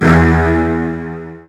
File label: CL EDGY, Strings